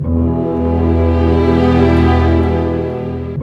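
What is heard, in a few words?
60 dark, orchestra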